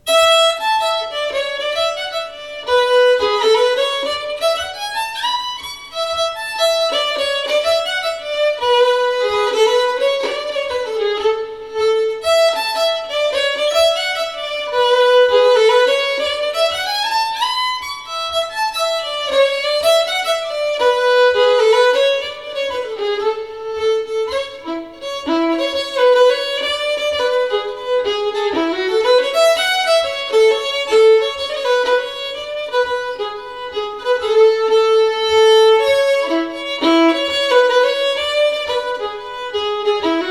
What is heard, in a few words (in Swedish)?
Polska; Polska till Hasse 70 år; egenkomponerade latar